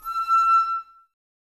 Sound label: Flute, Woodwind